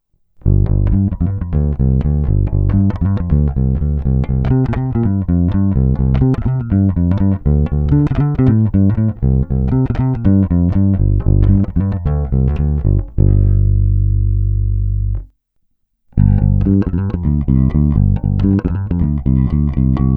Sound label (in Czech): Ukázka 2 (FW), Mike Lull V4, baskytara